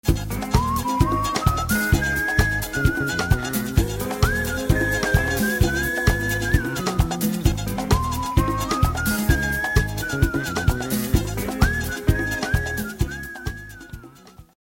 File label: Whistling